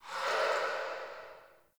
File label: Under City Cavernous Water Lap Rock Distant